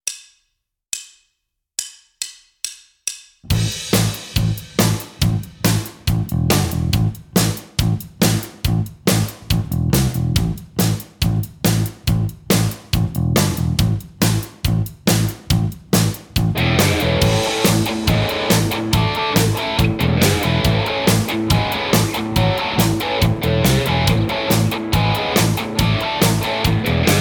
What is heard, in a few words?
jam track backing; Guitar Techniques 2019 Issue 290 January